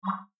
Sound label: UI Button Mouseover; disneyxd; bryx